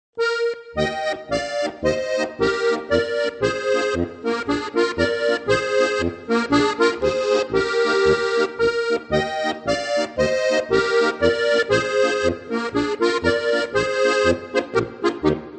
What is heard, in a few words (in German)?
polka; Mein erstes Spielbuch